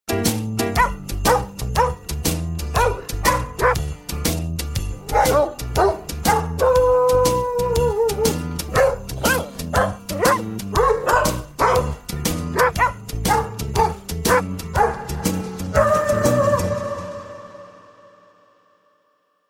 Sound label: Jingle Bells dog; Parodie